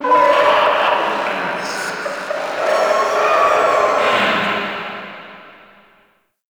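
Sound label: Infested Distant, Ambience; Nineteen Ninety Nine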